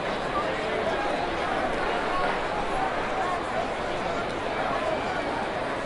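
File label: Boxing Crowd Large Ambience Loop; Crowds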